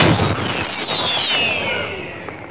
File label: pulse; robotron